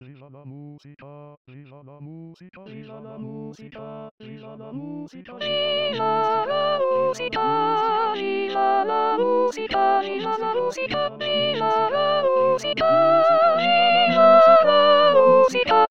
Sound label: Viva La Musica Soprano; chorale adultes